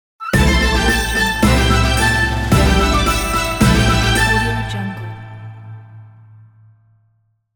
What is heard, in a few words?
chinese logo